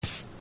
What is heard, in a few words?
screech noise doors; roblox